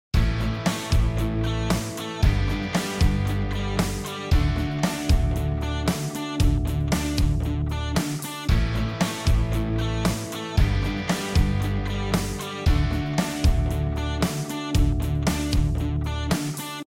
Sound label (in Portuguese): harmonia V IV I